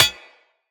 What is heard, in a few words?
Bullet Impact Armour; Weapons